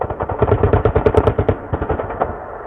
city battle; hl2

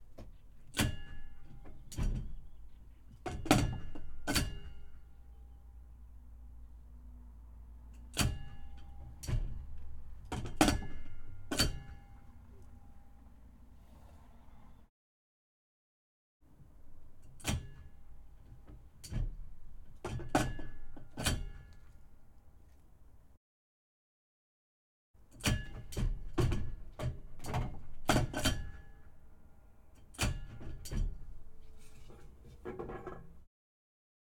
Pvpjbv 2062 - Cannon Reloading - ONBOARD - Trolly

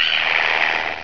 attack, headcrab poison; hl2

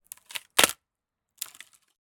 Wood Snap; InMotionAudio

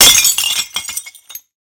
glass bottle smash; 3maze; FINAL IMPACT

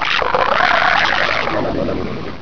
die, headcrab poison; hl2

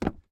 FOOTSTEP Metal Board Run Barefoot Male; Timothy McHugh; Barefoot on Metal

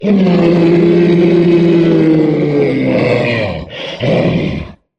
troll monster taunt; Gamemaster Audio; Troll Monster Vocalizations

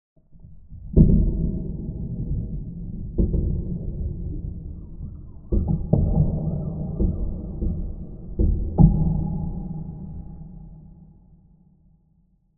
Deep Metallic Hits, Epic, Sub, Bass; Jake Fielding; Haunted Metal Vol.3; Cinematic Tones & Textures

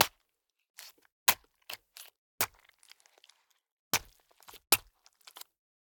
GORE Impact stab dagger in bodyparts flesh; Bones & Blood - Gore Elements; Articulated Sounds

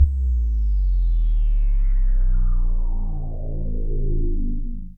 Sub Drop; 344 Audio

